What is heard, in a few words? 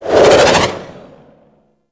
melee charge windup; Warframe; weapons